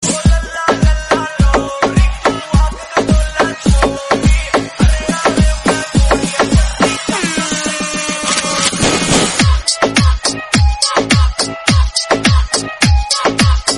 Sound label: DJ Remix